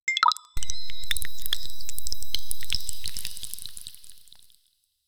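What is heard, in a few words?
energetic sound for energy, beverage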